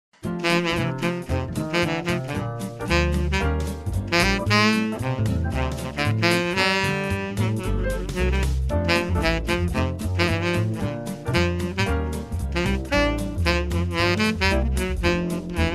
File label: sax